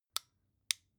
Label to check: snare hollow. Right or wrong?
Wrong